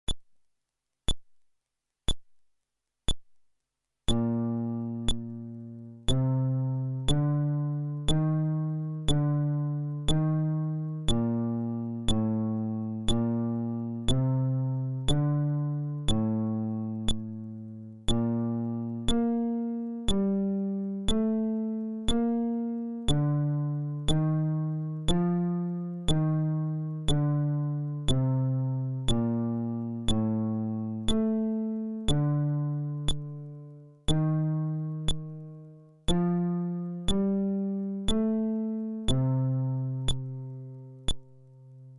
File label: Scale, fretless bass